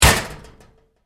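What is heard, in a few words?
Door Locker Impact Close Up Hard, Metal Door; Doors; Door,Locker,PK1,Impact,CU,Hard; Big Room Sound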